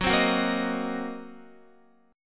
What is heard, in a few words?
Gb 6th Flat 5th Gb down Guitar; strummed; Gb6b5; JGuitar